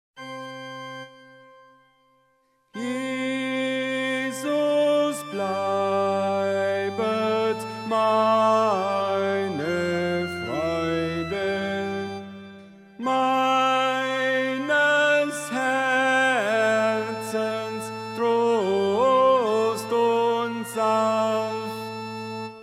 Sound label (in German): Tenor; Jesus bleibet